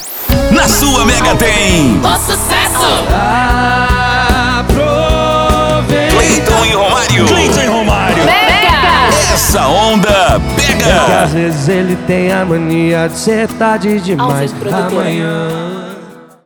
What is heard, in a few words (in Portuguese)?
INTRO MUSIC; MEGA FM